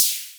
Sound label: Cymb, Clavia Micro Modular